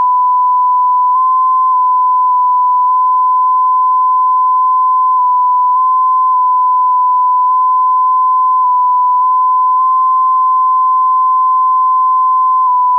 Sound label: JT9B; from WSJT-X with default 1500Hz tone setting